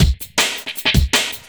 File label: Classic Beat, Drum N Bass